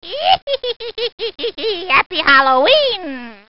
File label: cackle, spooky sounds